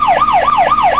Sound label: police